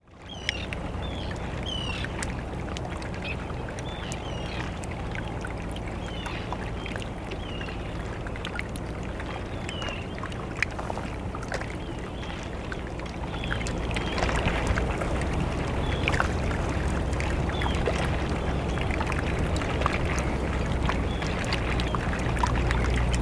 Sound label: Blue Glacier Jökulsárlón